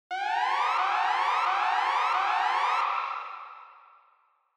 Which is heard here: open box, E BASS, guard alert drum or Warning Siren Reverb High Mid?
Warning Siren Reverb High Mid